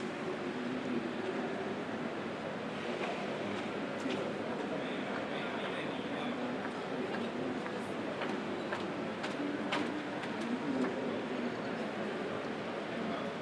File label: Book Convention ambient sounds; Taipei